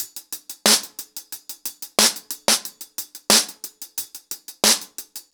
ACOUSTIC DRUMS, 90 BPM

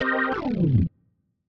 hit me; RoBKTA Sample Pack Supreme

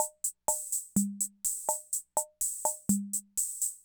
Loop, classic machines; arranger

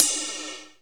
FX Cymbals, Percussion; Dance Synths

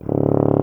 tuba C; Miroslav Vitous; Solo Instruments VOL-2

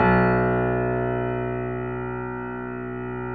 B 0, Keyboards Acoustic, GRANDP ST; USB Soundscan vol.17

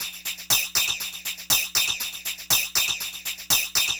Percussion Loop; Drums & Percussion; Electronic Point Blank

